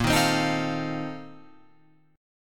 Bb Major 7th Suspended 2nd Suspended 4th, Guitar, Open F; strummed